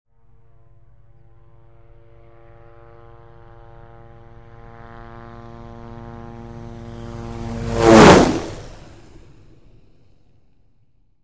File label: Helicopter flies fast